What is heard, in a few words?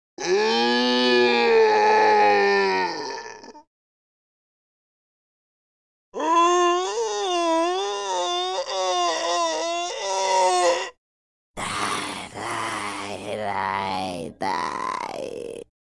Monster Groans